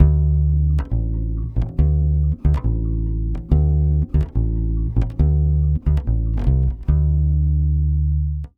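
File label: 140 BASS D minor 7, Brazil Bossa; USB Soundscan; AKAI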